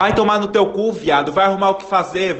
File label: music